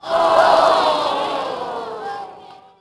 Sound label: ohh, crowd; Splashdown; XBOX